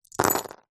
leisure board game tokens metal handful set down on playing board; monopoly